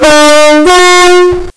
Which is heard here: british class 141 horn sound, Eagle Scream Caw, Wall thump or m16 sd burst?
british class 141 horn sound